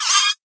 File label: land idle, guardian; minecraft; mob